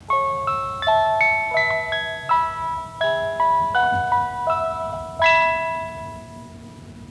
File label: chime; 14系客車オルゴール